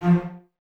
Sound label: Cellos F 3; Miroslav Vitous; String Ensembles; LINKS ORCH